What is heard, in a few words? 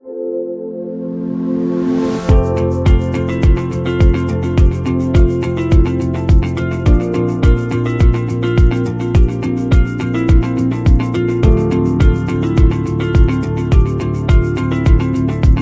The Inspiring Corporate, corporate music; corporate music vol 12